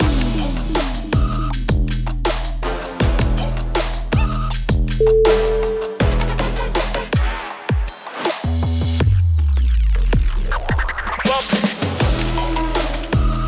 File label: street trap